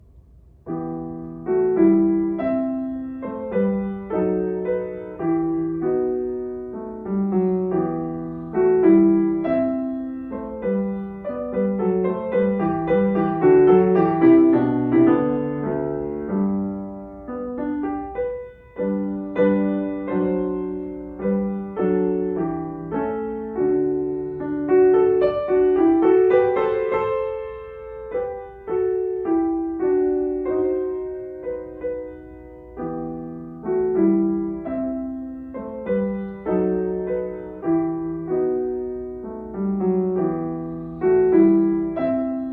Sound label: piano piece; mayer emilie